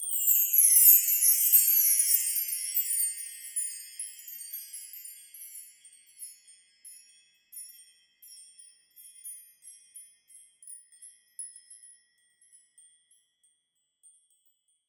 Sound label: Chimes Finger Down, Bell; One Shots; Drums; Ableton Live 12 Lite; Core Library